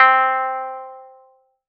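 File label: pluck C3, 5U Modular; Polyend; Simulacrum Sounds; 1 Shots; Synths; Leads and Keys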